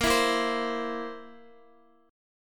Bb Suspended 2nd Flat 5th Bb down Guitar; strummed

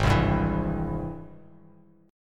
G sharp Augmented Major 7th G sharp Bass Standard; G#+M7 strummed; 4,3,5,9; JGuitar

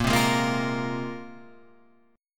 A sharp 7th Suspended 2nd, Guitar, Standard; strummed; JGuitar

